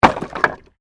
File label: Wood Movement Medium